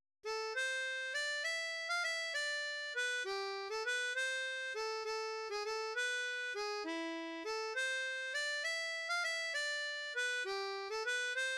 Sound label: Greensleeves, harmonica